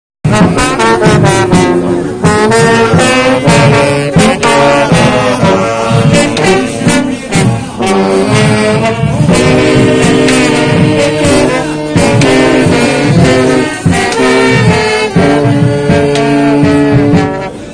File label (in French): fanfare